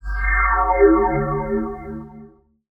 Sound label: Abyss, Deep Atmospheric Sounds and Soundscapes; Bluezone